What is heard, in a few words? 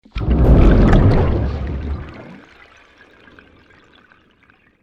EXPLOSION UNDERWATER LARGE, Created explosion events, underwater explosive event; Bomb Sound Effects